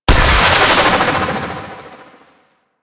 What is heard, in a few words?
Boost Thruster; Vehicles; Archwing